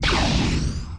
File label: PLAS GLAS; Messiah; FIELDS